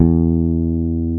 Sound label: BAS; Best Service Gigapack 2